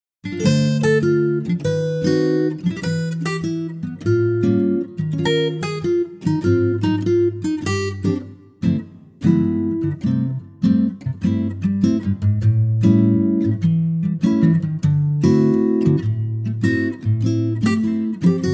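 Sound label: C acoustic guitar; key - C - vocal range - B to C (optional D)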